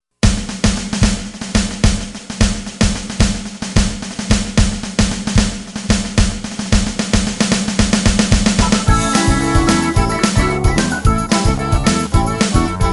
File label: foot stompin